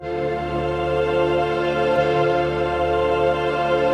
122 BPM Pads Amaj Gmin Tonic D, ambient synth pads; MMB